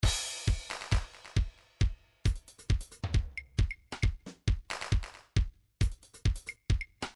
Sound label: trance midi loops beats